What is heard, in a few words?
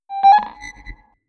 blip Near; Wall Ping Sensor